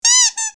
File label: Playful toy squeeze; shorts sound effects